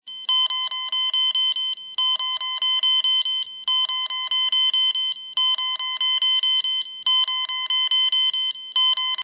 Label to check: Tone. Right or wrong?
Right